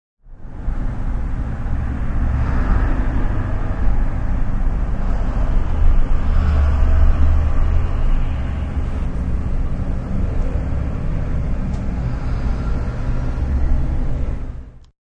Traffic Through Window, Sounds of traffic outside window; Traffic and Cars; SFXsource